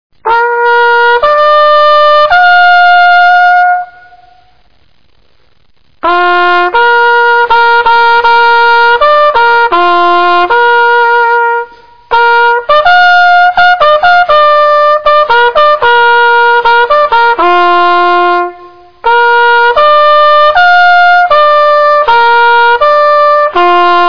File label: call to quarters, bugle